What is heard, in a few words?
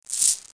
chain rattle; NETSTORM